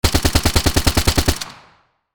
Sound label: AK-47 assault rifle short burst; gfxsounds